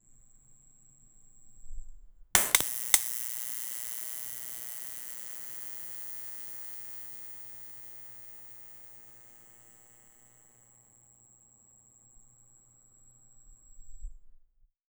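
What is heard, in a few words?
the sound of a fluorescent, destruction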